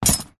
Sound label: horse saddle leather metal set down jangle